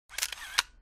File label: camera